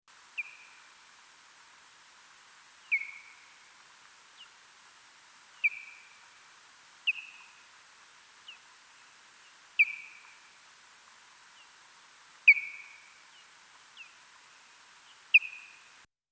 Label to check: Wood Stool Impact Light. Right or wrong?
Wrong